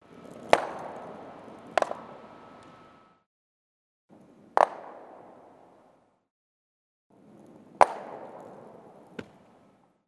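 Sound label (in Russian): керлинг камень ударяет по нескольким камням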